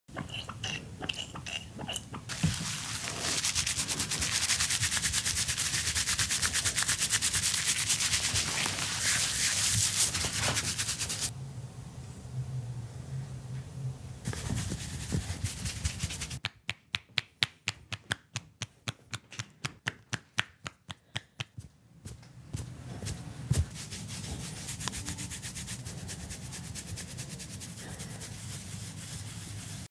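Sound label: head massage, asmr; ماساژ سر اسمر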